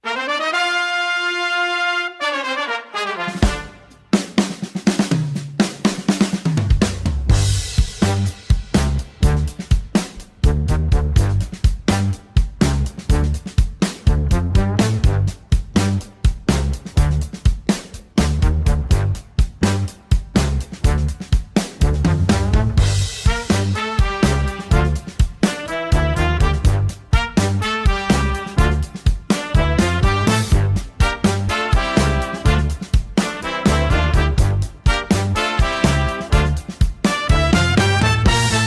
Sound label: Backbeat boogaloo, big band